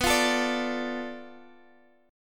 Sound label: B Minor 7th Flat 5th, Guitar, Bm7b5 chord; Bm7b5 strummed; x,x,9,10,10,10; JGuitar